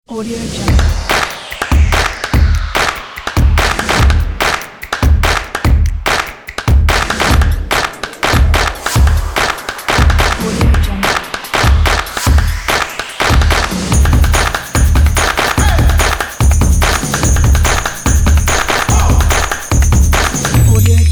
sport percussion

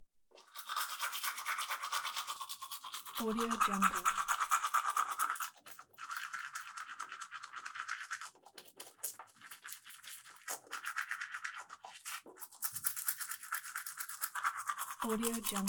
brush teeth; bbrealsound

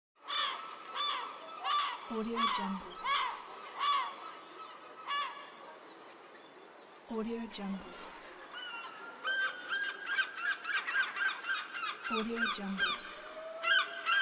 seagulls

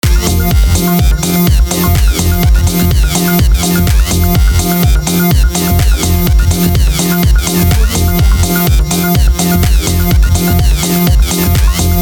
hitech dance loop

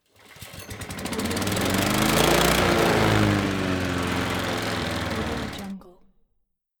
petrol lawnmower engine ignition

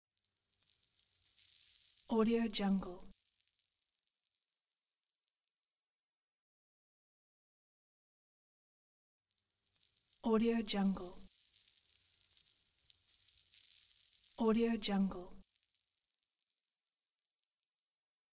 gold dust shine; danielmix

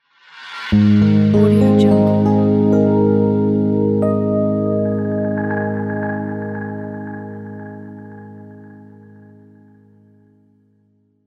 elegant logo

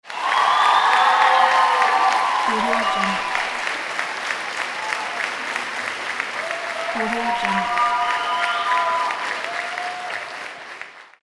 ovation

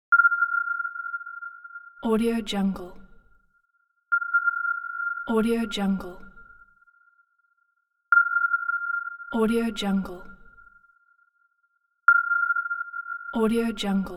sonar

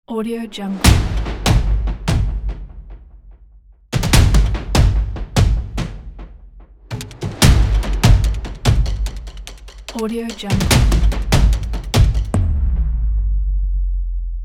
action percussion

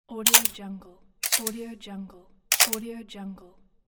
camera shutters

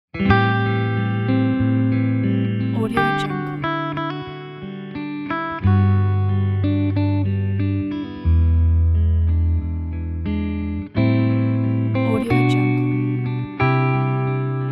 soft warm jazz guitar ident

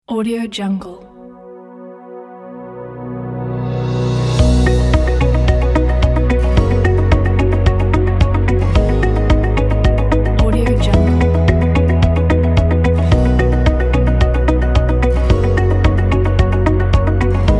commercial ambient corporate logo

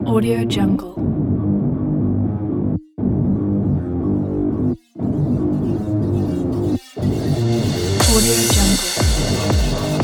upbeat metal sport electro

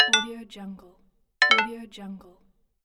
usb connect disconnect

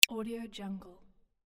tick

